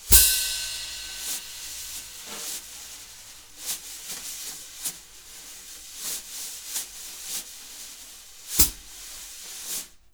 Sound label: BALLAD, Jazz Latin Drumloops; USB Soundscan vol.08; AKAI